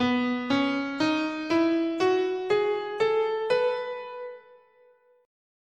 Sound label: B Mixolydian, scale